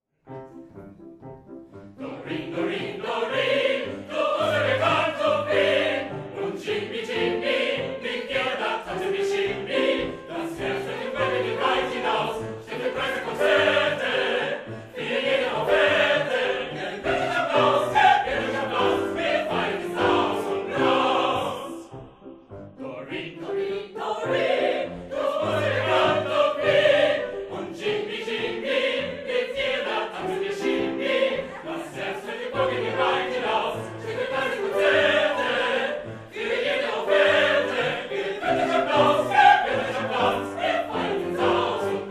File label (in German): gesungen vom ganzen Ensemble; Meisterkurs